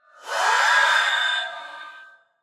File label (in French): Banshee Cri; Phasmophobia